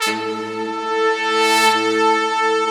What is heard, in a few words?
big brass, Slow Big Brass